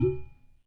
UDU SLAP, African Perc; E-MU Formula 4000 Series Vol. 4 – Earth Tones

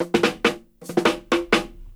125 fill dry, drum loops, dub; 125 BPM; Drumdrops In Dub VOL-1

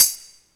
TAMB

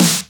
Snares; Vengeance - Essential Clubsounds Vol.4

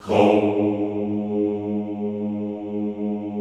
HOUUU, Classical Choir

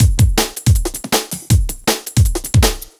160, Drum Loops; Lollipoppah; M8; Electronisounds

